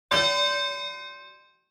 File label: Boxing Bell